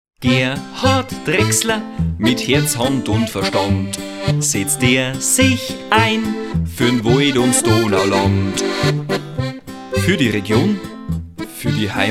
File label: Jingle